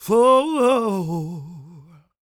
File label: CROON, Vocal Planet Blues & Soul; CW SOUL